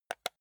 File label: console controller home press slow